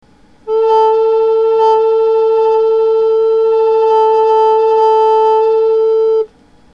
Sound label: burble, recorders